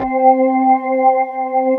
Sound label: B3 HAM, Keyboards Old School